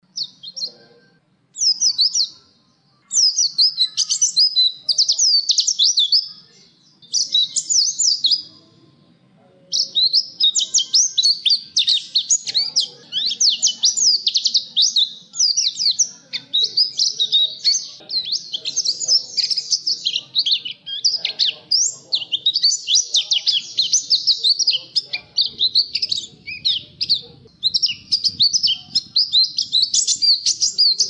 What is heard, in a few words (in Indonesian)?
Suara Burung Pleci Gacor Ngerol Panjang